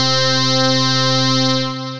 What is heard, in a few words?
Synth Keys; Mood Swing Keys